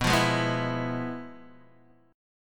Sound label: F Minor Major 7th Flat 5th, B